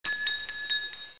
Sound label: bell